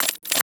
UI, mechanical, subtle, percussive, thick, bright, hold release; Eiravaein Works; Start Select